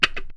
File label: GTR DUB; E-Lab; X Static Goldmine 2; akai